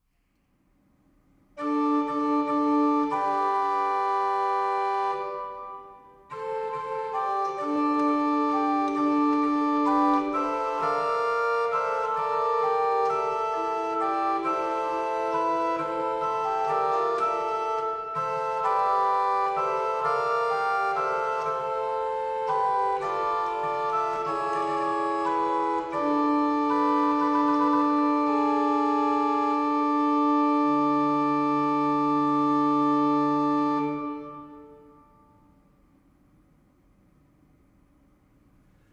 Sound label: Alleluia I (Instrumental); The Krasiński Codex (Fifteenth-Century Music from Cracow)